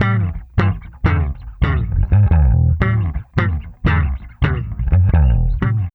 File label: BASS, Funky Ass Loops; East West